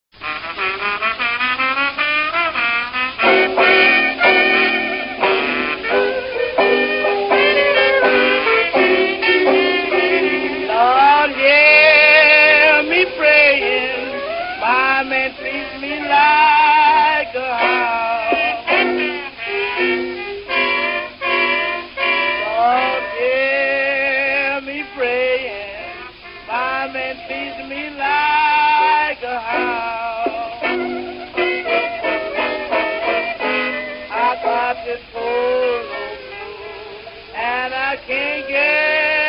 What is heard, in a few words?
toad frog blues